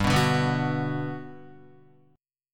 G Major 7th, G, down, Guitar, GM7 chord; strummed; JGuitar

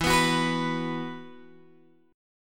E Suspended 2nd, Guitar Open G; x,9,9,11,12,9; down

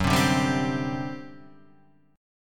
G Major 7th Suspended 4th, Gb

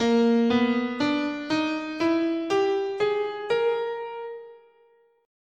Asharp Oriental

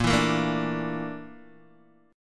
A sharp Major 7th Flat 5th A sharp Guitar DADGAD

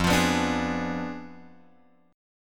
F Diminished 7th F; Fdim7 Chord {1 X 3 4 3 4} strummed

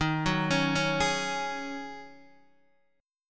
D sharp Minor 11th D sharp Guitar Open D, chord

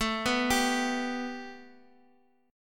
A 7th Suspended 2nd A, chord

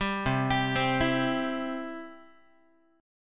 C Major, Guitar; arpeggiated; JGuitar

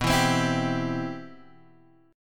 C Major 7th, B, guitar chord

